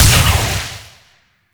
Shot Small; Weapons; Warframe; Duviri; Incarnon Adapters

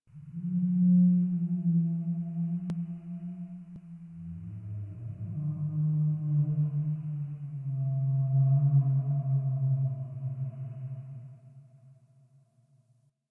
interior texture large moan; Ambience; Misc Indoor; DE; Warframe; Infested Eris

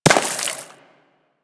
Bullet Impact Water; Warframe; DE; Weapons

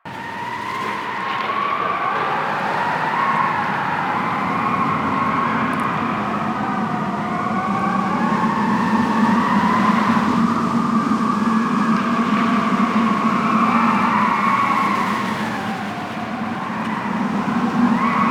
Shipyards Ext Wind Amb; Ambience; Exterior; Warframe; Grineer Shipyards

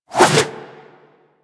Backflip Whoosh; Warframe; Generic Foley

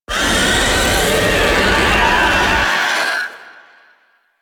Vocal Attack; Warframe; Teralyst; Ropalolyst

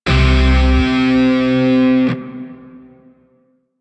Shawzin Blues Chord L; Grineer; DE; Warframe

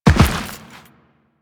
Land Dirt, Footsteps; Warframe; Teshin

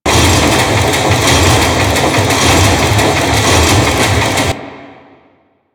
Forest Oil Rig Pump Loop; Ambience; Props; Warframe; Grineer Forest

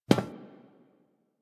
NPC Step Default, Footsteps; Warframe; Friendly NPC

